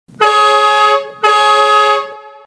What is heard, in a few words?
jeep kit, horn; kleinn